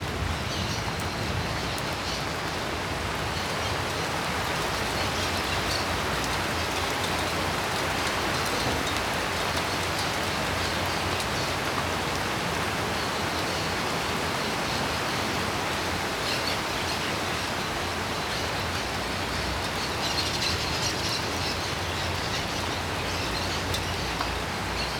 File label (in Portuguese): Chuva com passaros na varanda